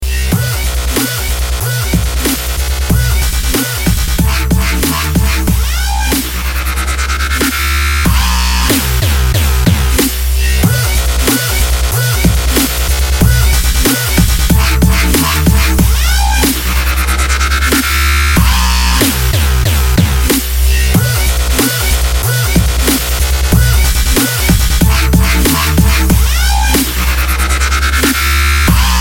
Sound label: super dubstep beat